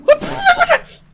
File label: mating call